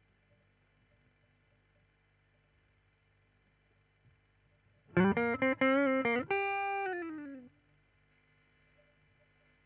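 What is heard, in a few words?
Guitar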